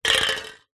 foley cans soda 200ml 2x empty knock over on concrete; zapsplat